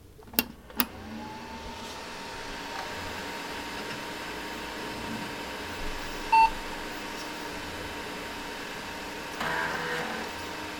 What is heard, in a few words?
Computer On